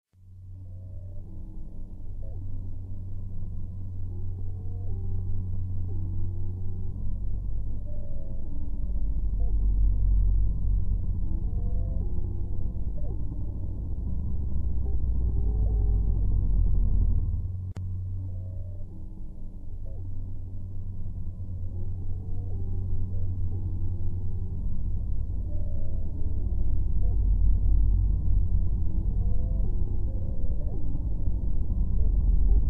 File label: Medium Noise; entropy rising